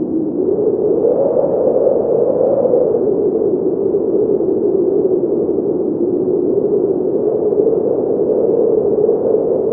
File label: Wind inside